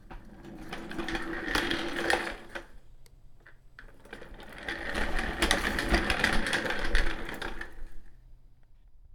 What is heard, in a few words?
Desk chair Rumbling